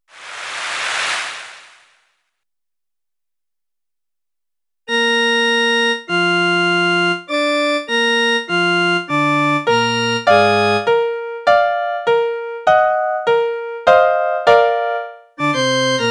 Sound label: Tuba Mirum Tuba; Mozart; Requiem